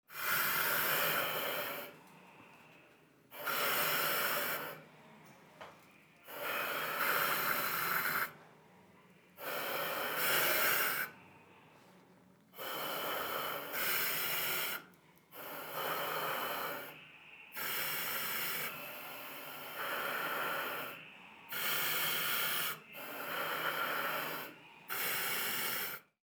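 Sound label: breathing apparatus at fire department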